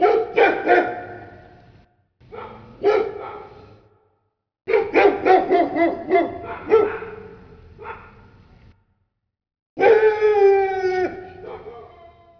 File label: dog loop, ambient; wartso